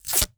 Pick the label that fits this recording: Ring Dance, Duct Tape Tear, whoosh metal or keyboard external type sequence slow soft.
Duct Tape Tear